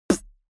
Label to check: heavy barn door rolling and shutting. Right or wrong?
Wrong